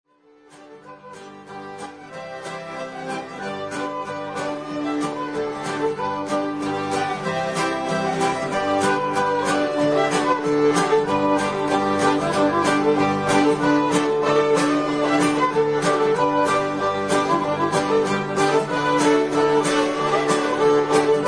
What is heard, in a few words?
cajun fiddle song